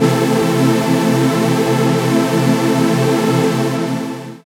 Prophet Sings, Underground UK House & Electro, Pads; Sonic Foundry (Sony Creative Software)